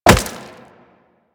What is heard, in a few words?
Wall Jump Rock; Footsteps; Warframe; Tenno; Wall Run